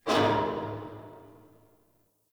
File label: Parking Lot Metal Door Distant